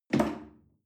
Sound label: chair wood take hard